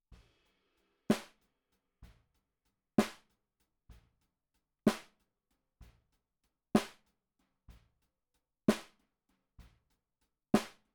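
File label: Snare Bottom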